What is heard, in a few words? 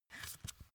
magazine flip back soft